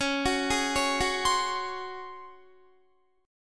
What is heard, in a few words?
Db Major 7th Suspended 4th Db down Guitar; arpeggiated